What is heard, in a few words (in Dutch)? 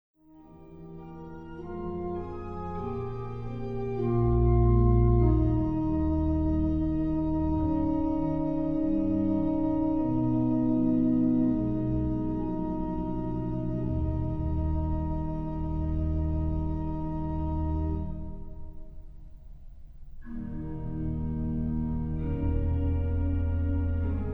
inleiding trio en koraal over psalm 84